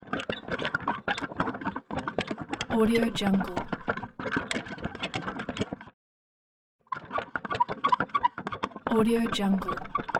cart rolling

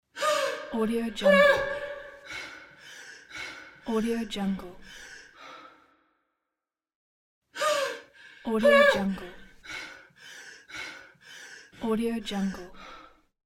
woman panic breath

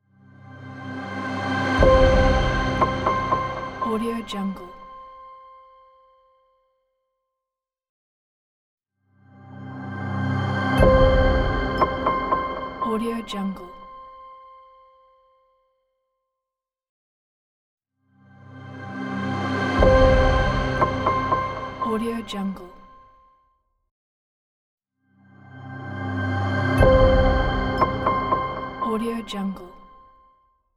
minimal logo

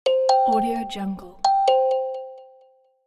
notification sound; olibrykov

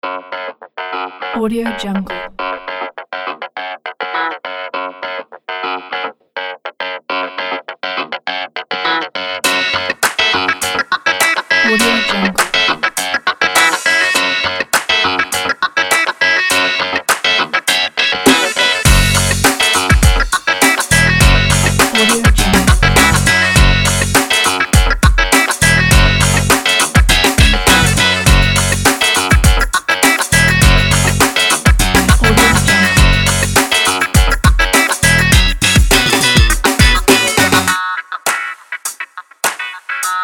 summer funky disco

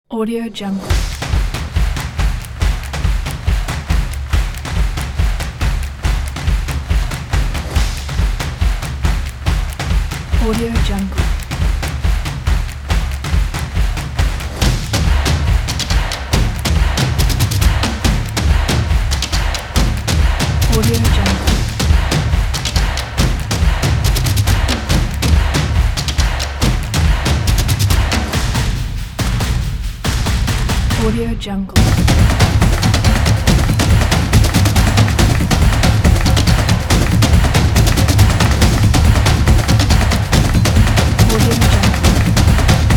drumming opener

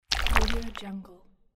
mud squish; tibasound

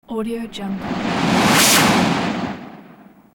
phaser blast reversed

